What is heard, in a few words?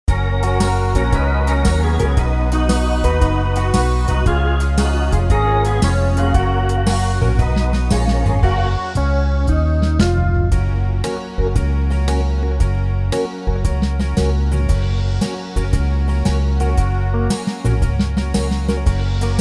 shuffle slow